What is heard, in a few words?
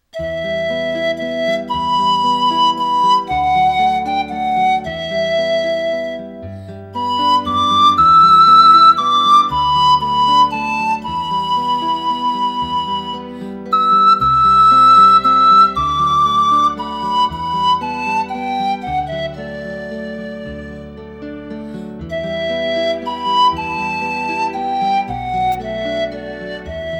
Panflute